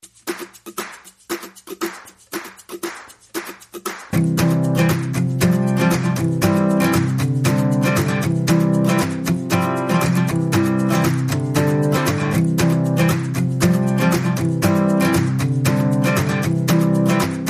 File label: Light Mix (No Ukulele Only Percussion and Melody)